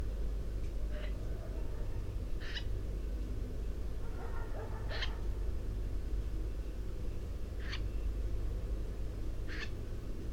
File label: Common Snipe Gallinago gallinago; Ladakh